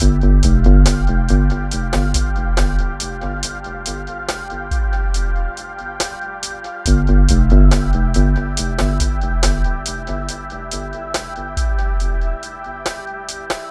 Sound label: vibes